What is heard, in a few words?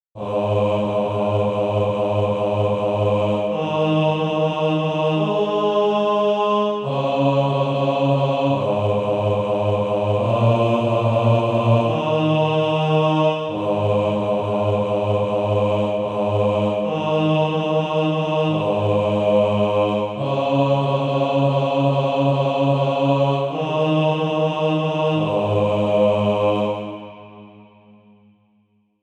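bass, Practise Music; Dare to Be a Daniel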